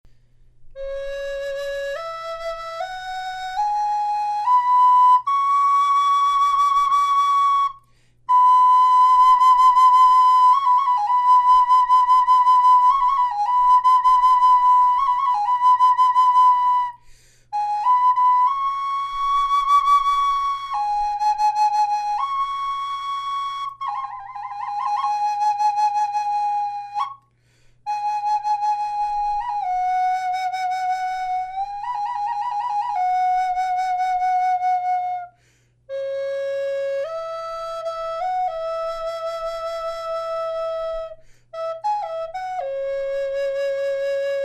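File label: natural, flute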